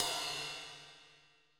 ride, Roland MC-909

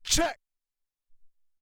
Check, Alesis SR-18

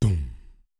perc voice, Roland MC-909

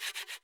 perc, Roland MC-909

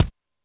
kik 22' Power, Alesis D4; drums

